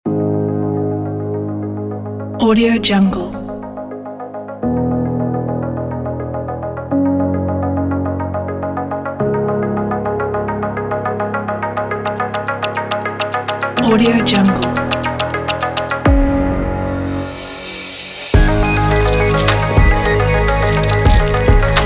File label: future technology corporate; munhauzensky